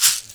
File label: CAXIXI; InVision Interactive; Percussion Slam; ZOMBIE